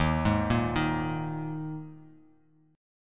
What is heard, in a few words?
Eb Suspended 4th Eb Bass Standard; Ebsus4 arpeggiated; JGuitar